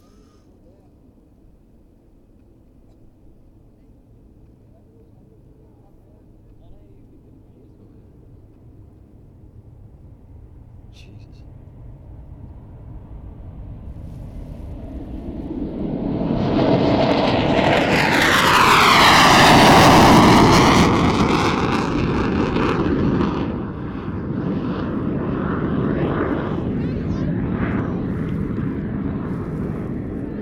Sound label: Tornado Jet